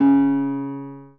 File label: spanish guitar